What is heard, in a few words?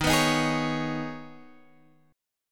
Eb 7th Suspended 4th Sharp 5th Eb, Guitar Open G; down